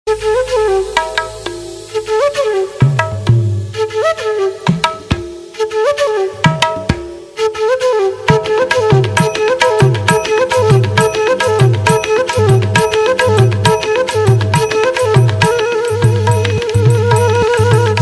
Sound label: Flute Vs Tabla